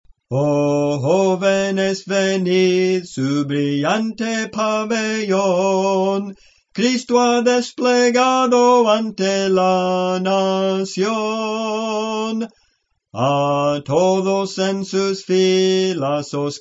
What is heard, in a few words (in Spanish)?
Soprano, Cantos Espirituales; Soprano (melodía); 020 ¡Oh Jóvenes Venid!